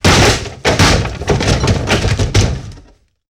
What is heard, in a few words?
Wood Destruction; Impacts & Destruction Sweeteners; Sony Pictures Sound Effects Series Volumes 1-10; Sony Creative Software